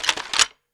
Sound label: shotgun load; weapons; dota; snapfire